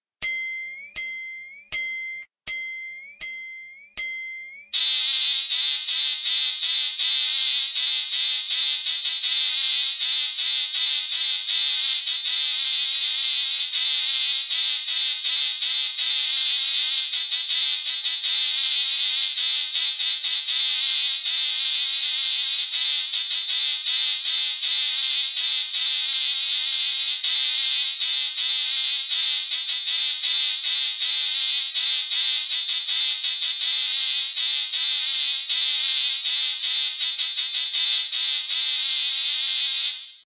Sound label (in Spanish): FANFARRA PRIMERA VOZ